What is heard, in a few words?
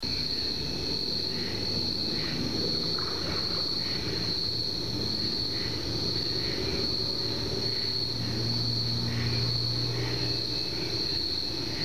wood knocks